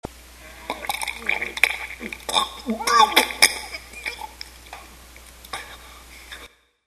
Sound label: vomit sound effects